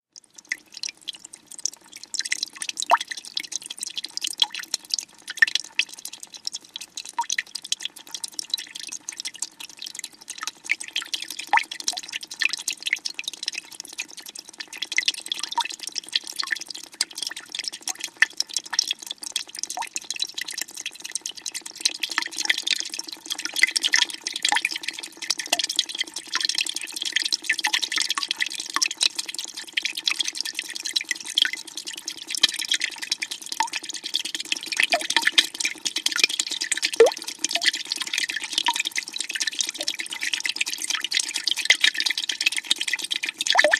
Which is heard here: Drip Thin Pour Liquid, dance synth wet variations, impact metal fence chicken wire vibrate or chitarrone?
Drip Thin Pour Liquid